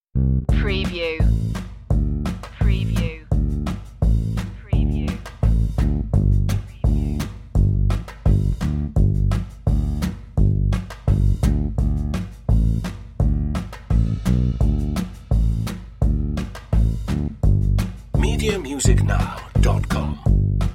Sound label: perc and bass only